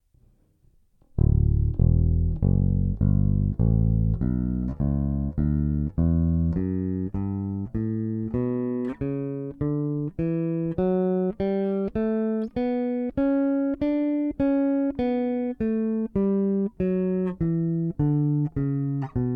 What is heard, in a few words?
LTD Neck Pickup